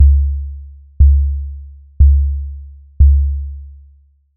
bass drum body 70Hz; ableton live

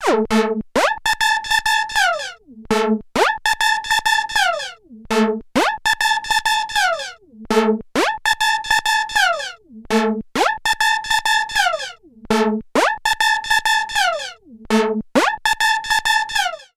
korg minilogue electro lead

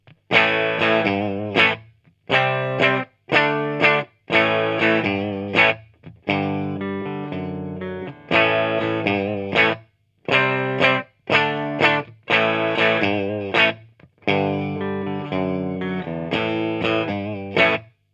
6L6; palmer drei

